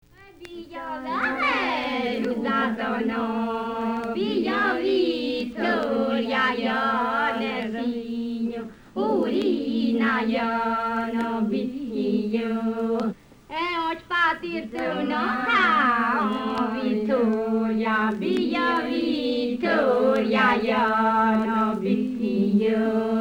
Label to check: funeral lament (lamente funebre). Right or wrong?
Right